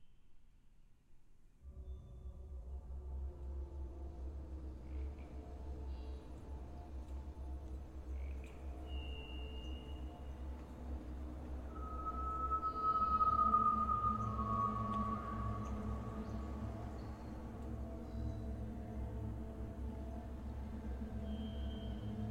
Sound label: menu ambience; Semblance